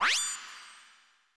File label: codec open; Metal Gear Solid